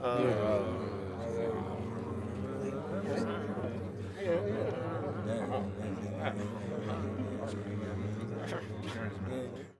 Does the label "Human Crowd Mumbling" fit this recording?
Yes